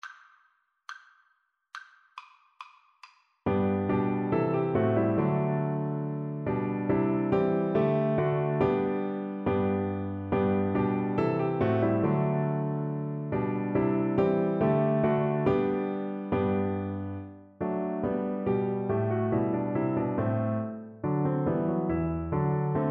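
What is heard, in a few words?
glockenspiel, Percussion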